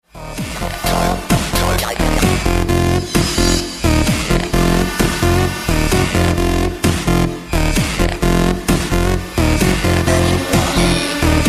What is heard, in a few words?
electro